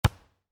Volleyball hit soft, Volleyball (Exterior): Hit Soft; Sports; Miscellaneous Sports